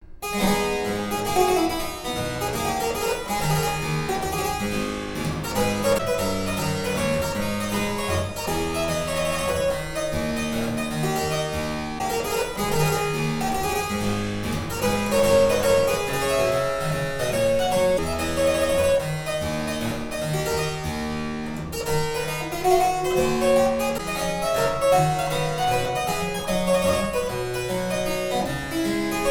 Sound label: Harpsichord Suite in A minor Corante